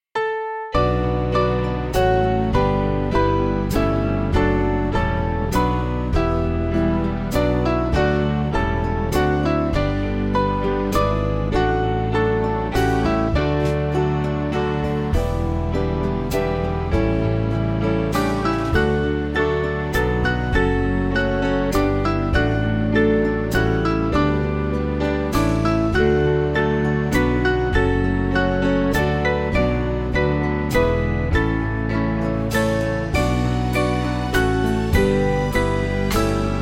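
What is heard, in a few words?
Music Backing Files for Churches; Temple Fortune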